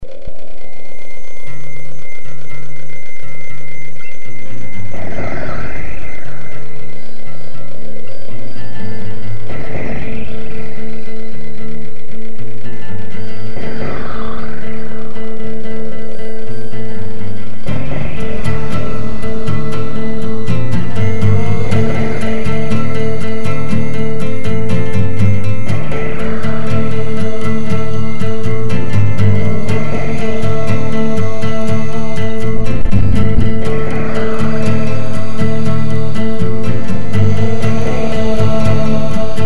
instrumental

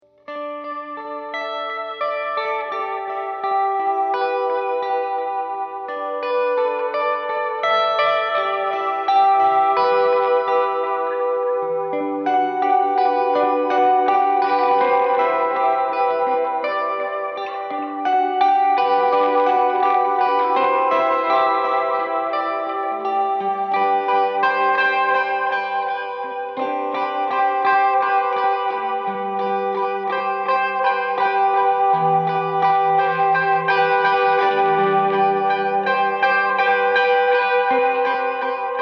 mando wet

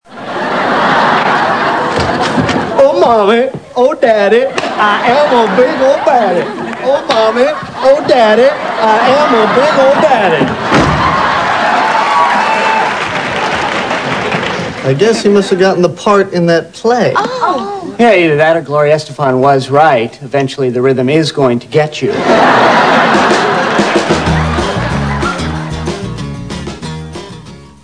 Singing; Joey; Oh mommy, oh daddy, I am a big old baddie

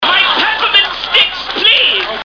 STICKS

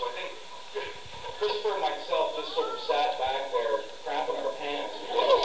crapping; tealc

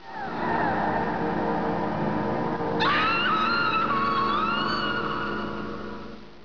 scream

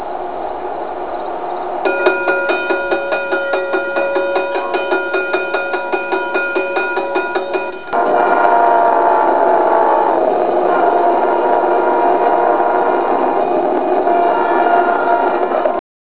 train bells

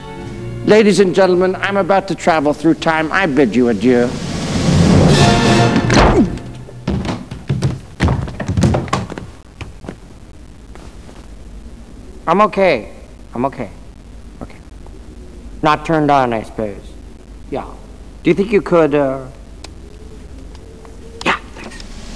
time machine

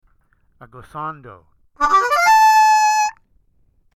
Glissando

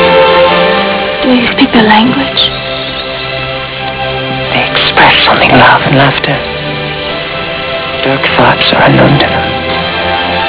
laughter

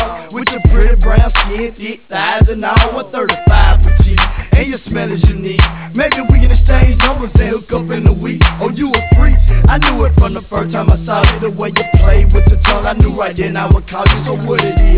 Trill

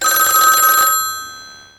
Phone Ringing; Phone Ringing 2

